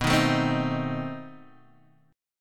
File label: B 7th Flat 9th, down, Guitar, Open G